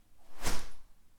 Swishes Fast

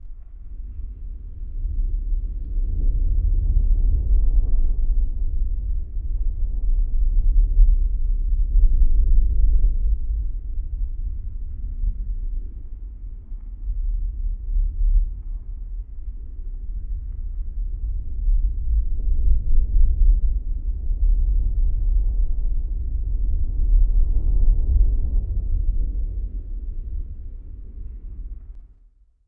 Death Wave

